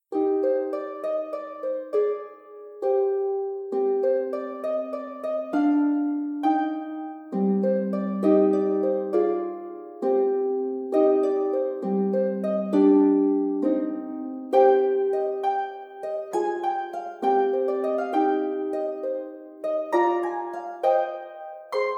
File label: Little Harp Pieces